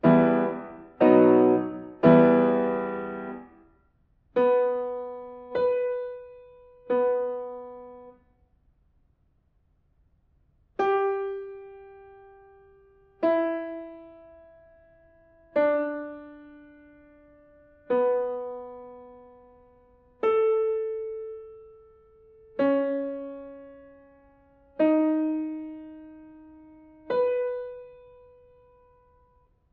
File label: diatonic collections